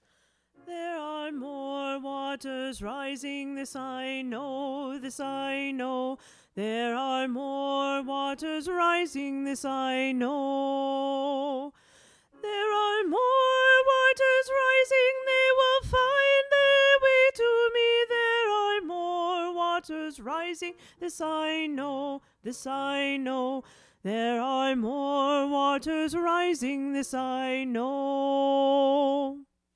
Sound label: Soprano